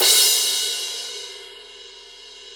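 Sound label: cymbal crash; Best Service Gigapack 2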